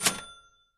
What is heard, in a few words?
chin